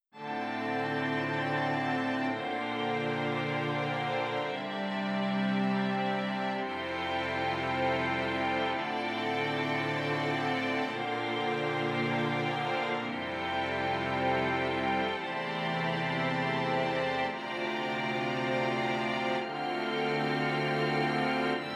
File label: Passacaglia Chords